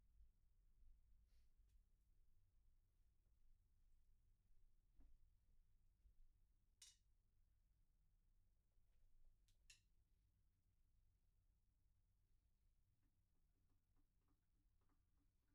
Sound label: Room